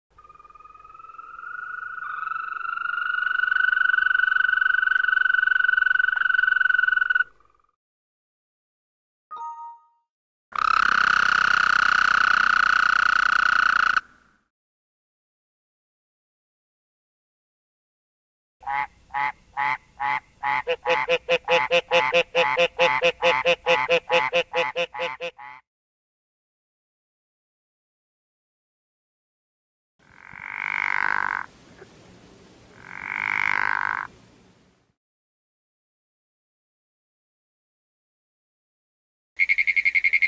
nature frogs

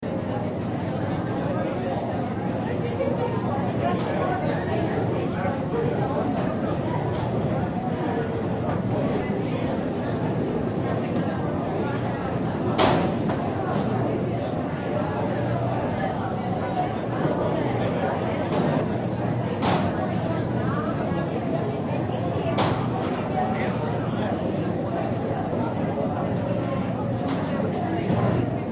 atmo airport crowd

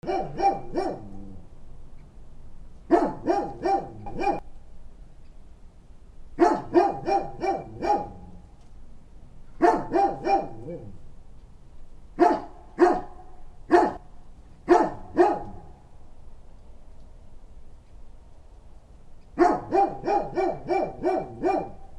rustic dog barking

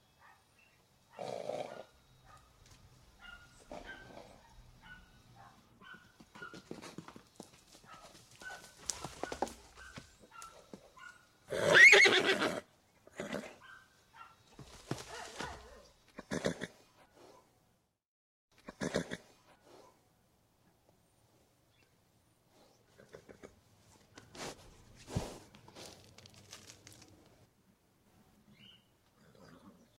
farm horse in a field

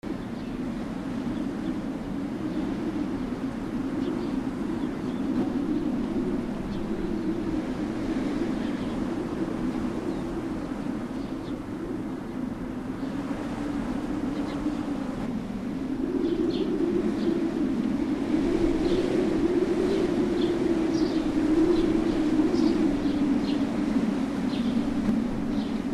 wind howling wind and birds

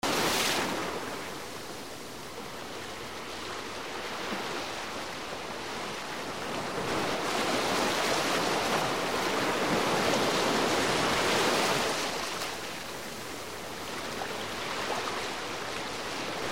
waves fast sloshes

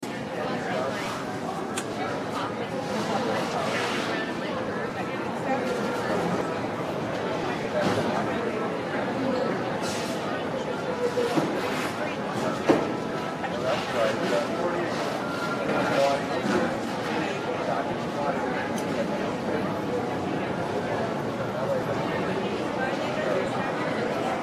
atmo airport busy crowd

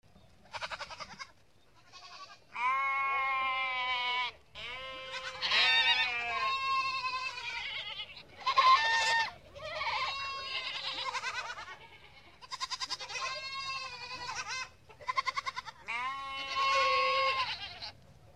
farm goats

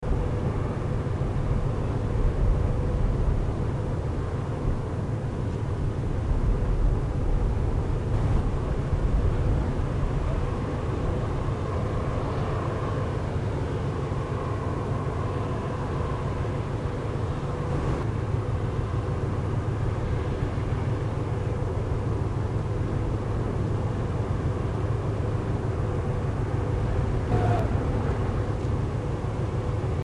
harbor port

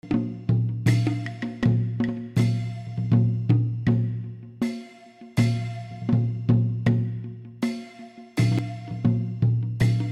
atmo asia instruments

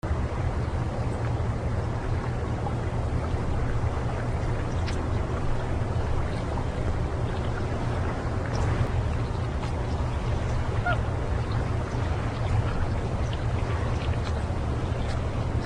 atmo harbor light dock water

